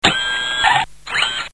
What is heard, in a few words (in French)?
Robot